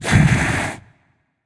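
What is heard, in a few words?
thinking, nonverbal; smite; khepri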